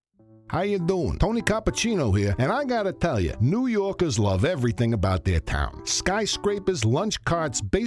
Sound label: Reed Electronics